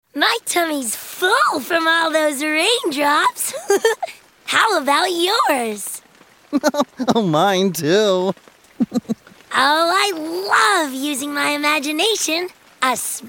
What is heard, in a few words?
Thunderstorms